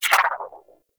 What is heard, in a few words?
Resonantor Augment Cancel Move; Warframe; Bard